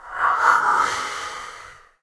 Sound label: Ghost Wound